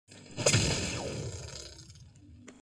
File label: emp shield breaker zero shield; fortnite